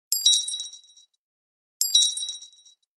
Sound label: Coin Sms